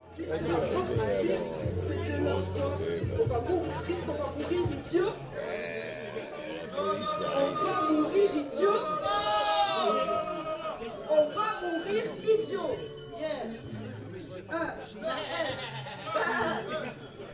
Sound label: Rap applause